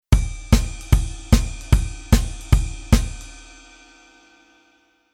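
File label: Rhythm Velocity 42 Snare Drum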